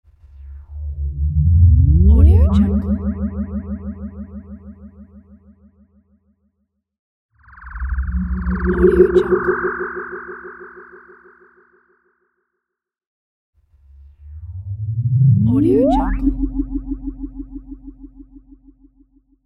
teleport; dauzkobza

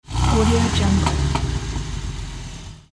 revving; kotanjian

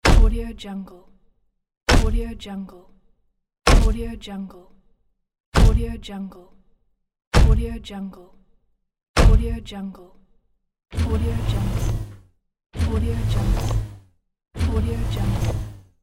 mech steps

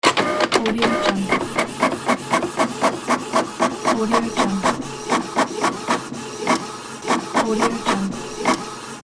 office printer printing document

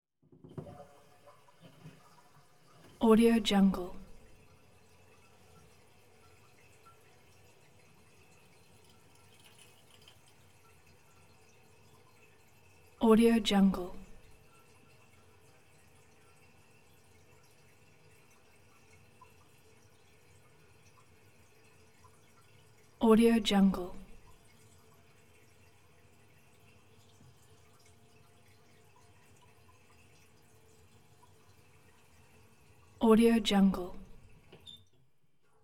pipe water filling; collanderson

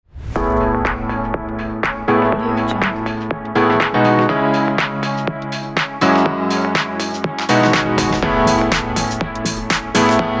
luxury fashion house

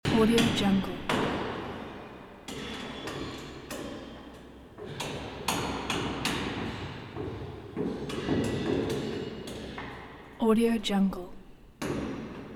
construction works

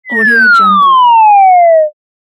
cartoon falling down sfx

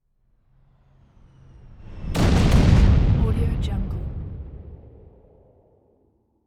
missile explode

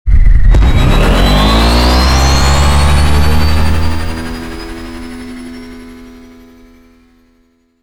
lightbike takeoff; soundmorph

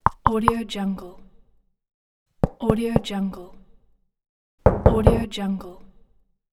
knocking

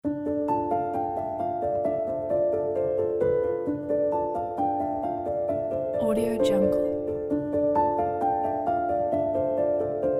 haunting cinematic piano; calstad

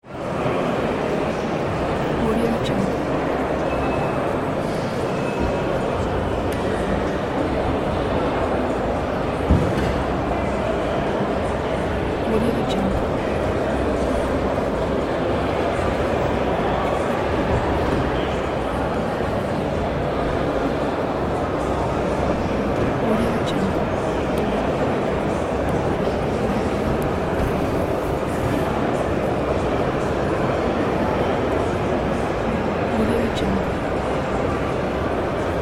large hall ambience

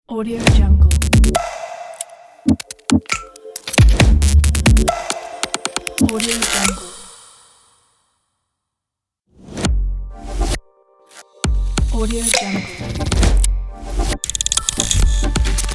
a modern logo pack

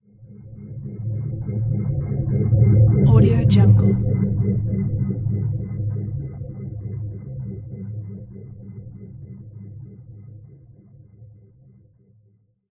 spaceship pass by; prosoundfx

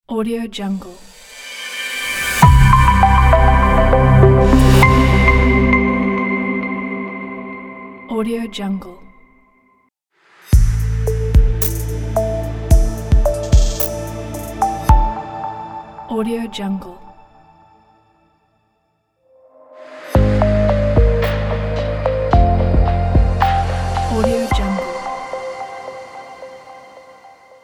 simple logo pack

